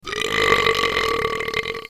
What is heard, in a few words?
brap